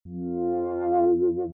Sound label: tone